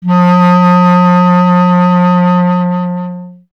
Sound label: B. Clarinet